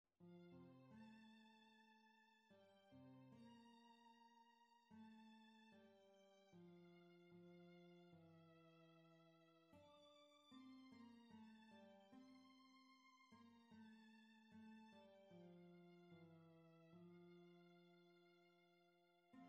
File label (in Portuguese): Violino 2, Dona Nobis Pacem